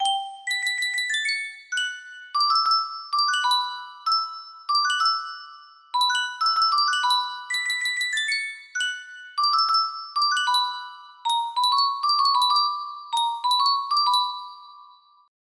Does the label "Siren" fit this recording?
No